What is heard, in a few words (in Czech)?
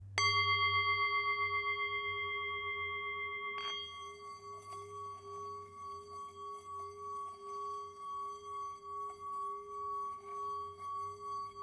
tibetska misa; Tibetská mísa Chuto malá; palička na hraní; Obrácený zvon, meditační mísa, léčivá mísa, himalájská zpívající mísa, tibetská mísa